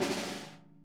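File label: snare buzz; Sonic Reality; Interactive Drum Kits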